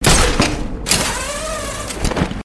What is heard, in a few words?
Grappling Hook